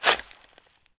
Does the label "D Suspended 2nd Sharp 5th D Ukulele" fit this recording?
No